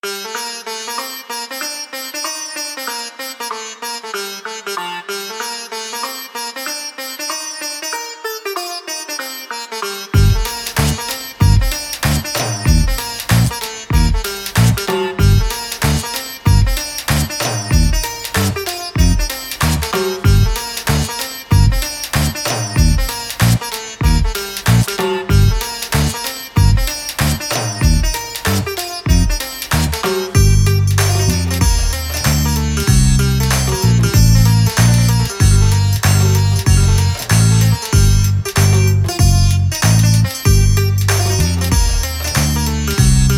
Perfect at an Indian curry shop Free BGM with very cool sitars